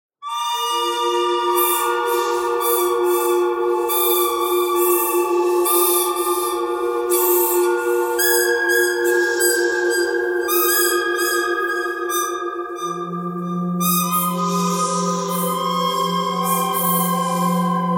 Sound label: bird canyon bird thread harmonic